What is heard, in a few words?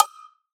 Cowbell